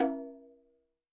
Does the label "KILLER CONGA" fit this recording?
Yes